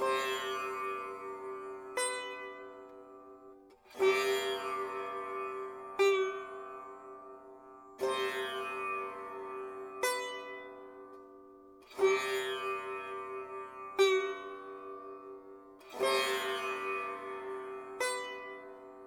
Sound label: sitar